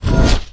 firesword

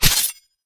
falchion

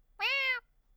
miaow

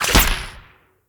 swordwhip

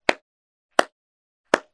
strange clap

